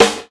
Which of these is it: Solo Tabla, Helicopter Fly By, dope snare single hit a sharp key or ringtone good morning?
dope snare single hit a sharp key